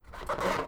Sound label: squeak; Politburo